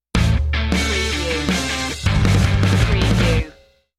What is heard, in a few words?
fist pump stinger